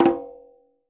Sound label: Quinto, Percussion